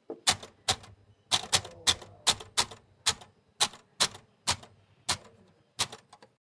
ADDING MACHINE SIEMANS ELECTRIC 1950S SEQUENCE, Electric adding machine